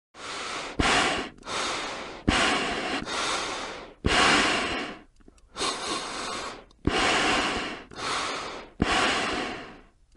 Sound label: mask breathing; fnaf 2